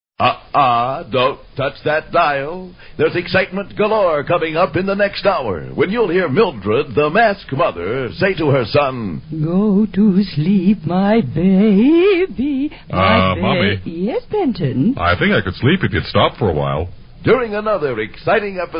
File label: singing to sleep; Promo; Chickenman